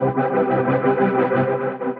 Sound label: Mod Pulse, Synth Rhythmic